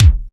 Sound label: BD NEUTRAL; drum; BASSDRUMS